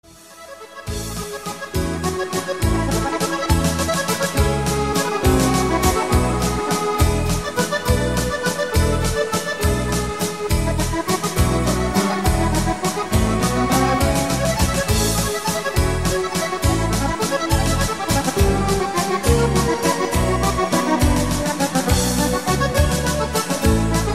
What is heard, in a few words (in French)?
VALSE MUSETTE ACCORDEON